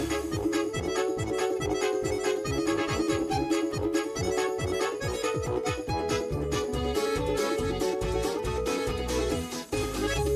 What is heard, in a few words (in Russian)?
garmoshka; zvuki k osennim prazdnikam; zvukovoye soprovozhdeniye